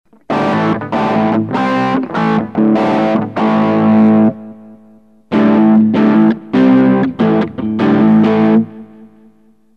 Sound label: Humbucker Single